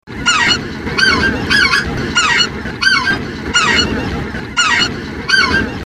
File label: 2 note